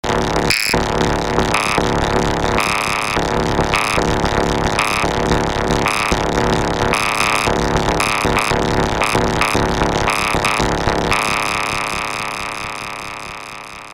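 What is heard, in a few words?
fx drum rhythm, Polivoks duophonic synthesizer; FX: polirhythm; Polivoks formanta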